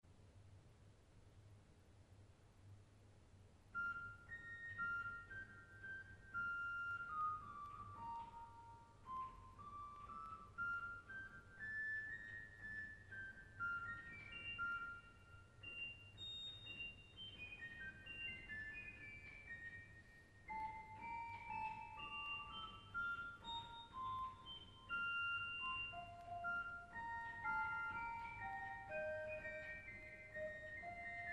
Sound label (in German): Waldfloete 2